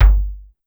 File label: Kick, Clavia DDrum-AT, Pitched Down